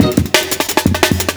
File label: funky q 175; 'Effection' samples; Breaks (Loops); lolicore